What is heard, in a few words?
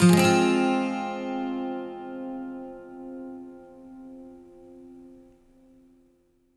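12 string minor, Acoustic Guitar Loops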